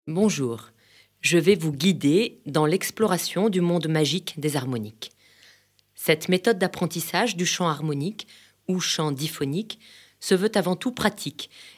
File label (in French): technique chant diphonique